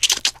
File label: positive noise, mechanic, survivor, voice; source engine; left 4 dead 2